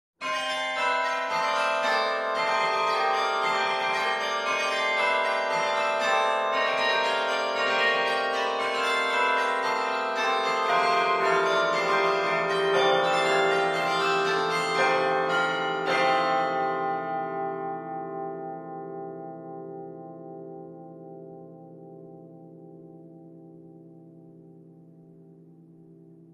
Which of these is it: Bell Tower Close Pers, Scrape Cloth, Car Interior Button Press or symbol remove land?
Bell Tower Close Pers